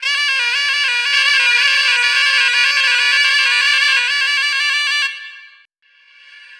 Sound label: Shenai, Wind Instruments; Sonic Foundry (Sony Creative Software); World Pop